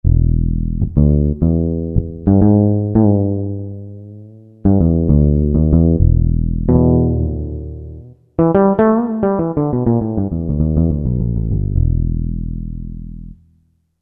Fretless; Korg MSC-10S Ethnic

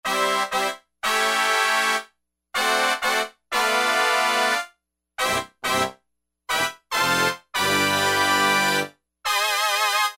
Big City Brass, E-mu Proteus-1; Presets

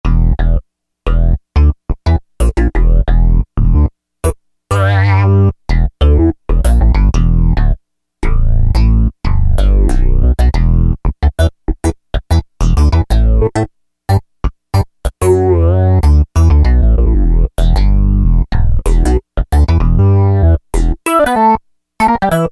Mo Funk Bass, Roland JD-990; Factory Patches

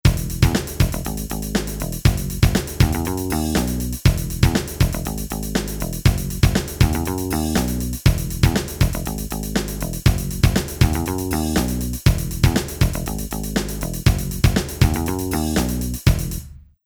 Pop

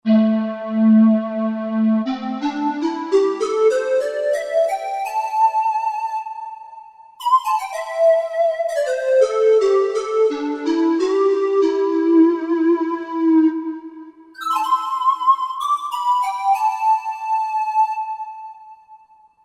Square Lead, Roland JV-2080

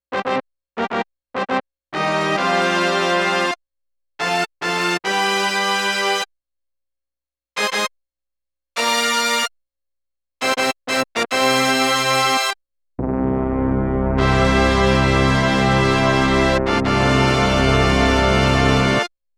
BRASS

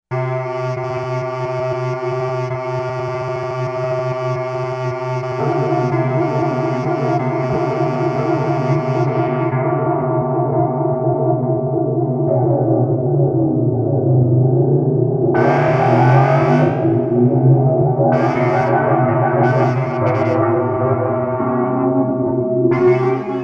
Disturbed Winds

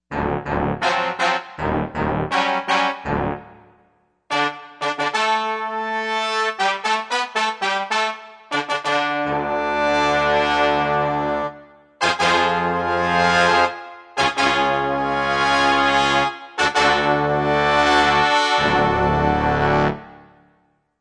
Orch Brass, Yamaha TG55; Factory Presets

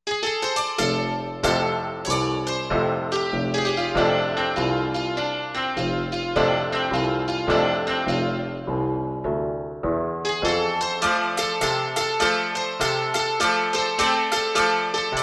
Tacky Piano

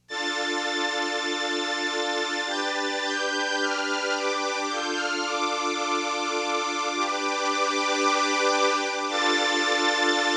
VIOLIN, Crumar Orchestrator

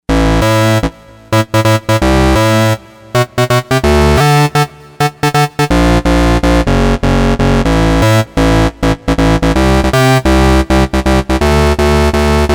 Oak Bass, Novation UltraNova; Factory Patches

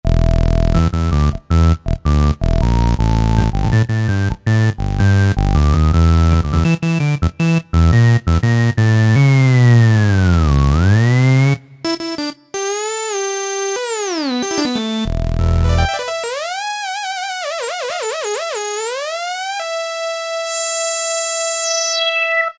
MC-Line, Yamaha DJX, Voices

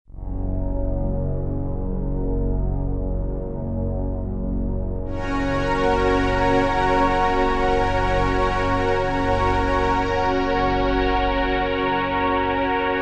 Rich Pad; Digital Synthesizer

